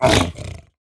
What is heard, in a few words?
piglin brute hurt; Minecraft; 猪灵蛮兵是守卫堡垒遗迹的攻击型猪灵变种。